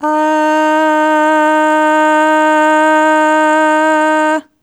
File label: Aah Long D#3 Dry; Harmonic Building Blocks